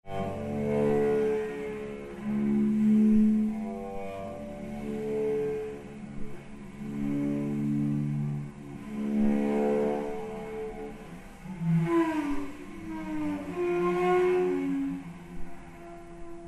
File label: whale; SAMHAIN